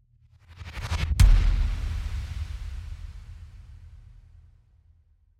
tremolo riser big hit

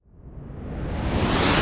cinematic reverse

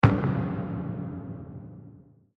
impact hit

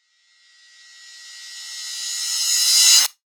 riser

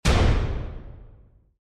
impact hit

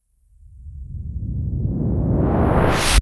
cinematic reverse

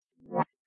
ui back sound

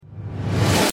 dramatic buildup

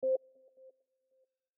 click button

